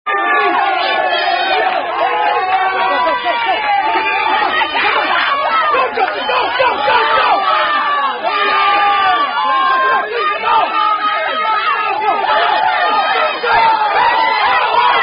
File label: Boatrace Rugby [Boat Race Drinking Game]; Boatrace (South Buffalo Rugby At Cortland)